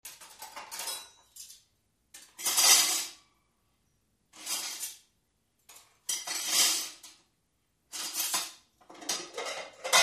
Silverware, Dumped Into Dish-filled Sink, Water Faucet, Dishes.